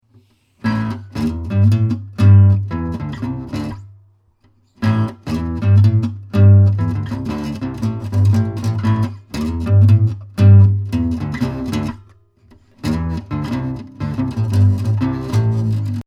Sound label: mod. Bassline